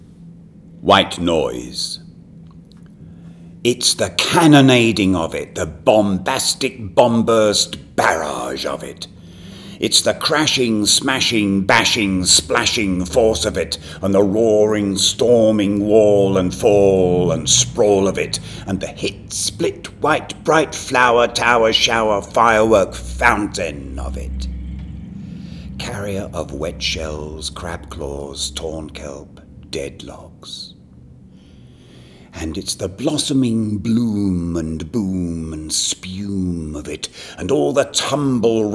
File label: White Noise